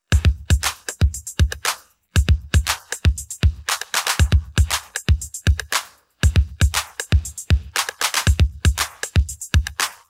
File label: drums